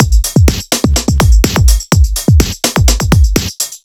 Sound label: Beat Break A